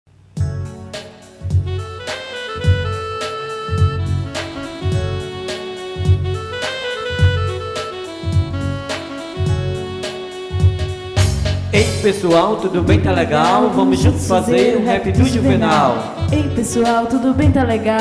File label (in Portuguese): rap